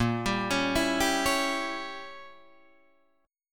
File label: A sharp Diminished 7th Guitar Open D